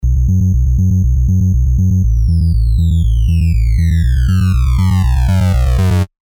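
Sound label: Sylenth1 bass, chiptune; One And Only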